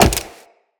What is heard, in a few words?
Wall Jump; Footsteps; Wall Run; Characters; Tenno